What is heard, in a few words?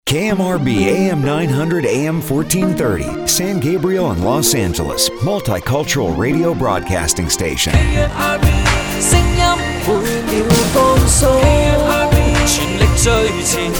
Nighttime ID Jingle; KMRB